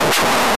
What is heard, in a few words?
dragon